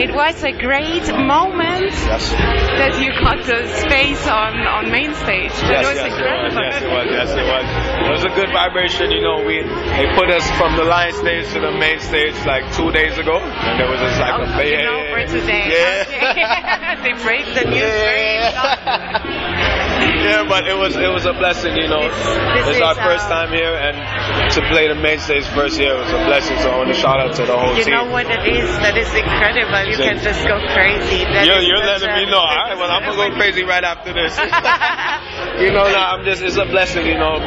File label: drummer